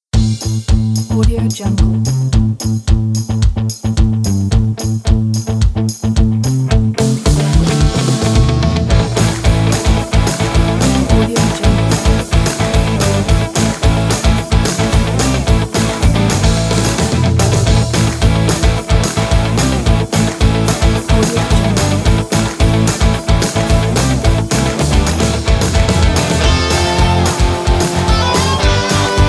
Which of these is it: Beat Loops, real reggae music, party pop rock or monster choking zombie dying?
party pop rock